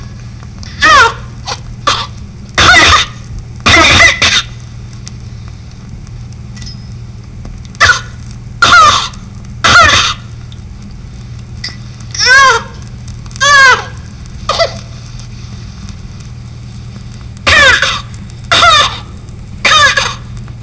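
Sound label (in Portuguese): mucoviscidose, sons e choros pediátricos; FIBROSE CÍSTICA (MUCOVISCIDOSE); PEDIATRIA UFF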